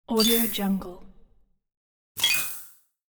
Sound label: scifi tone activation or release